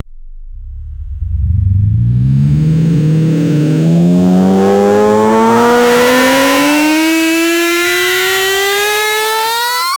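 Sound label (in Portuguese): ligar um poder vampírico, vampire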